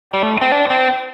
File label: Lick